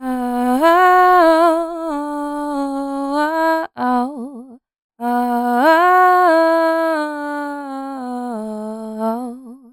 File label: "Oooh to au", Vocals, Chants